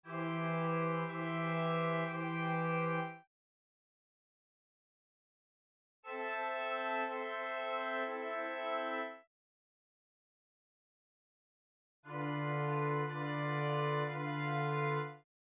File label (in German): Erste Verwechslung Sext Accorde